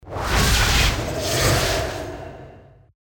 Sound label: spell banish magic effect; baldursgate